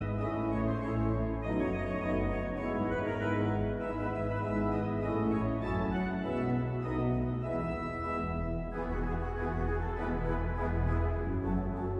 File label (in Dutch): orgel